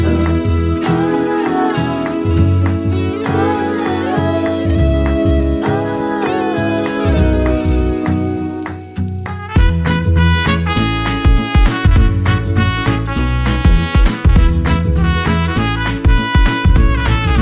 christian, christian gospel

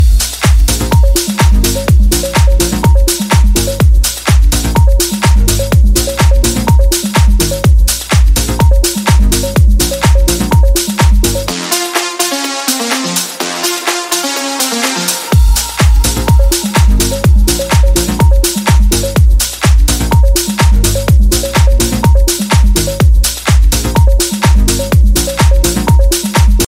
clubmix dj ringtone

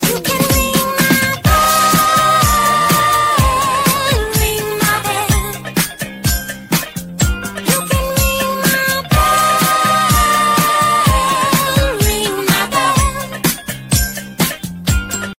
disco bell song ringtone

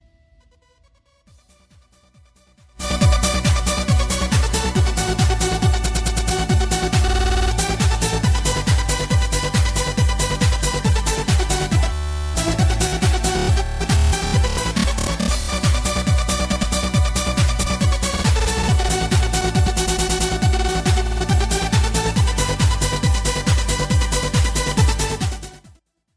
samsung j6 plus ringtone

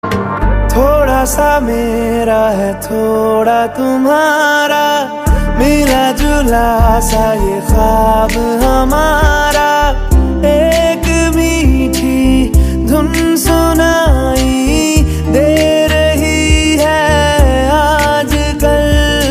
top bollywood ringtone

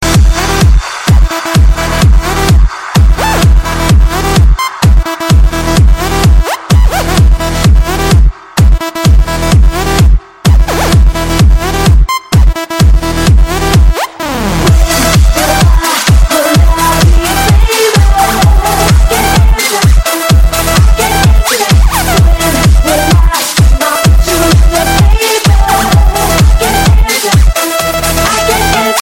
techno electro ringtone

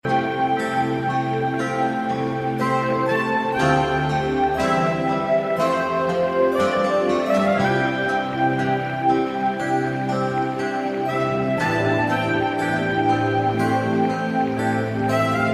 springtime dreams soothing music tone

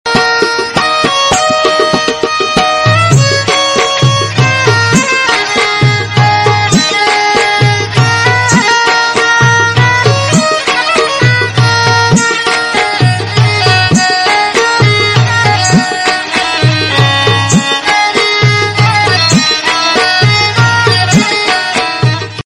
Baloch music ringtone

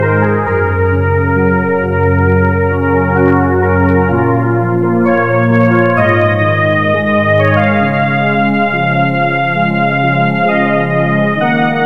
bisaya christian, christian gospel

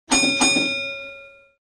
bus bell ringtone

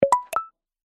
ringtone, nokia sms; rhea

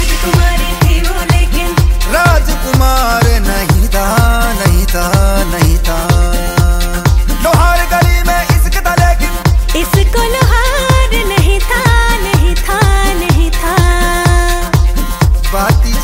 hindi ringtone

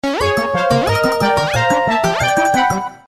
spike alert ringtone

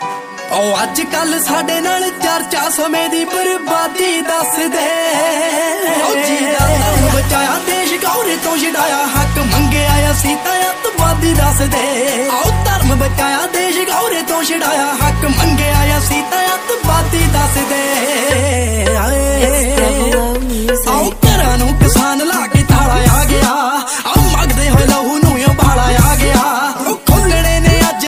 punjabi ringtone

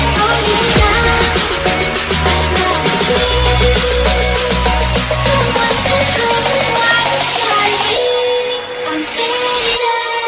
english ringtone; english ringtones

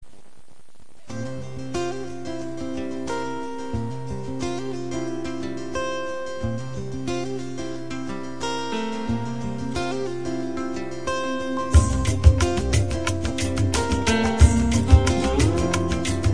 magical background music tone ringtone

